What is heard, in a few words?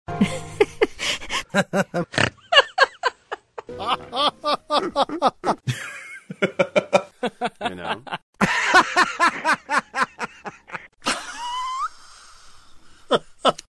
laughing